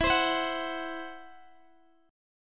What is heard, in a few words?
E Suspended 2nd Sharp 5th E, down, Guitar, DADGAD; Esus2#5; strummed